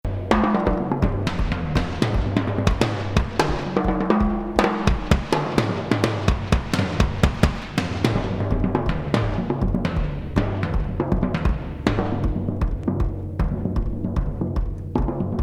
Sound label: WITH FLUTE